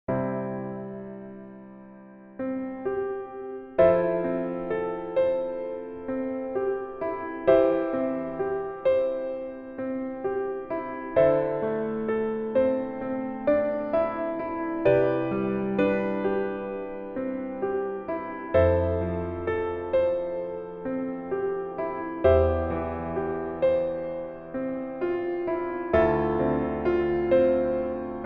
Piano ver 멜로디MR -1키

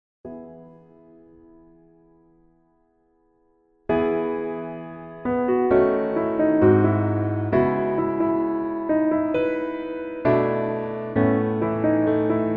Piano MR 멜로디 MR

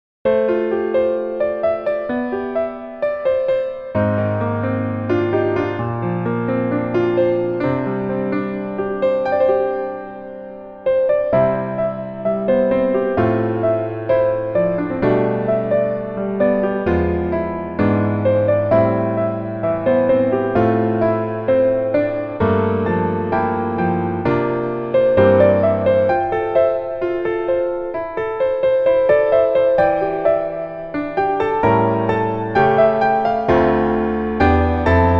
Piano ver, 멜로디MR

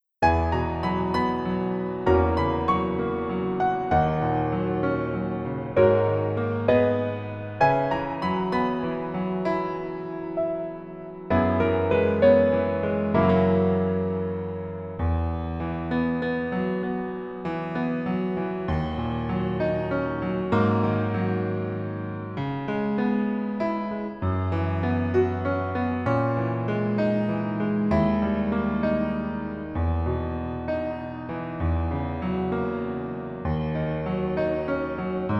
Piano ver -1키

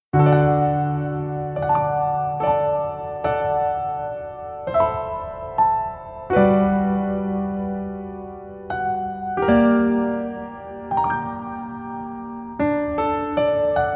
Piano ver 멜로디MR

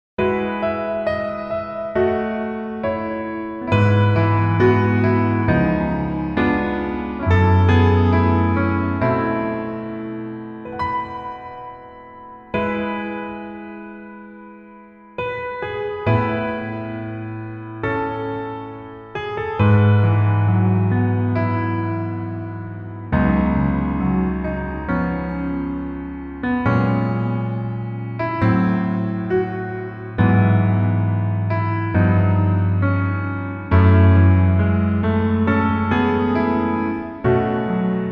Piano ver -1 키

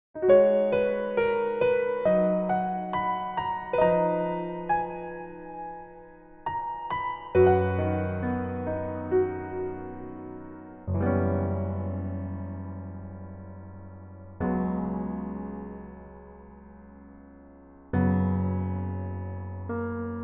Piano ver -1키

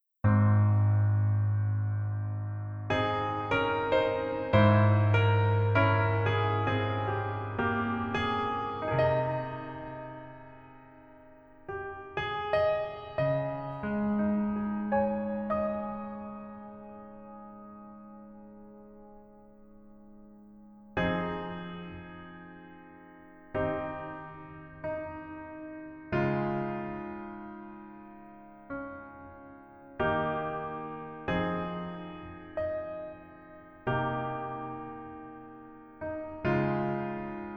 Piano ver, 여자키

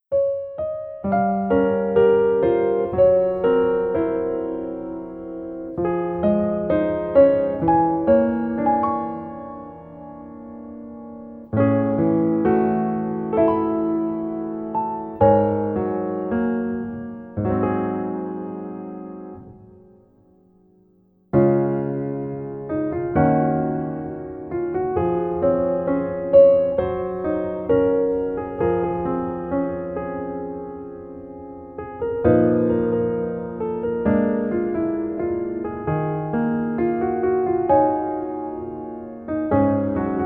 Piano ver 멜로디MR -1키

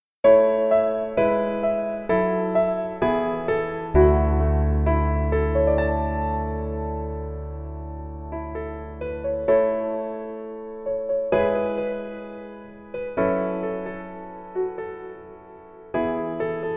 Piano ver 멜로디MR

